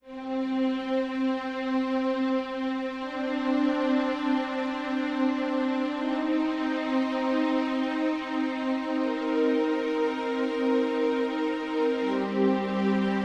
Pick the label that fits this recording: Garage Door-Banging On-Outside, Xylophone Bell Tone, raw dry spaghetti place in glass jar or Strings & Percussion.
Strings & Percussion